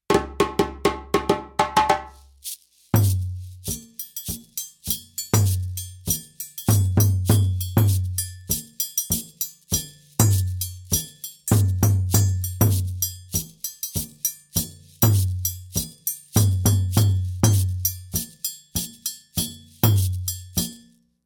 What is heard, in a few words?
kuku dununba; rhythms